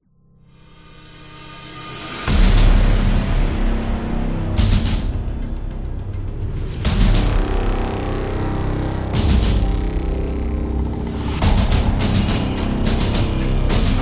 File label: Dark Beat; casual game